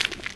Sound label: gravel footstep